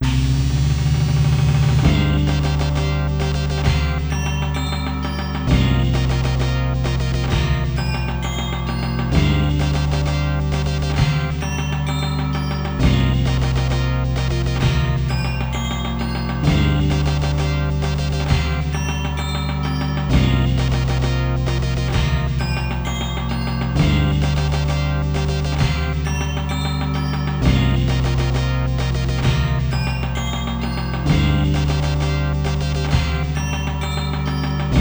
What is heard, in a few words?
overture; Knuckles' Chaotix; The 16bit Audiophile Project; Genesis